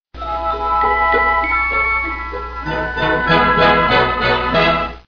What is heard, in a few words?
Twinkle Msg